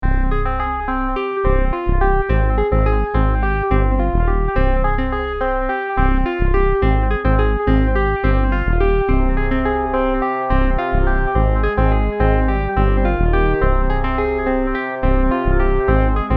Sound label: 212 BPM